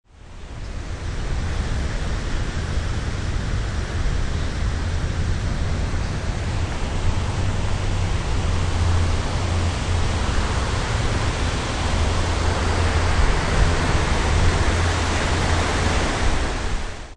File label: concourse